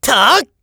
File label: attack, voice; jakigan